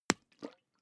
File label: hot-water bag punch light